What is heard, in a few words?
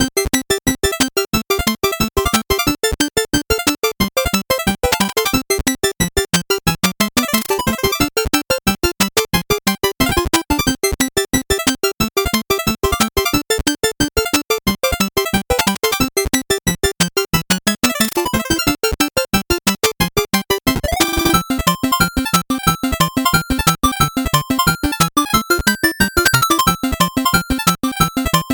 8bit bgm fast